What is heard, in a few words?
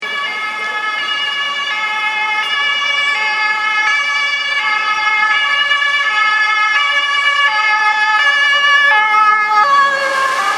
Doppler Sound